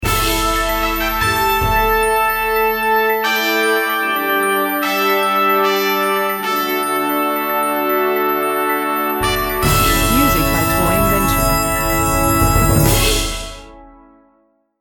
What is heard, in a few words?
Intro Fanfare